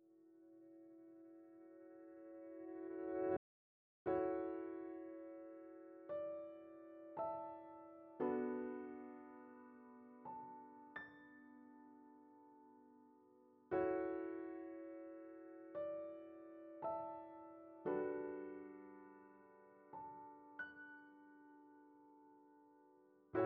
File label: piano; Pony Fan Music Remix Stems; 87BPM